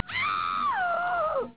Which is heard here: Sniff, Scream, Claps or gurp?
Scream